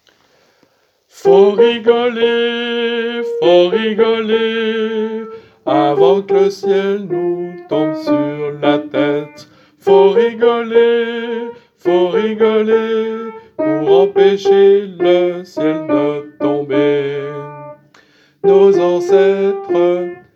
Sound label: Alto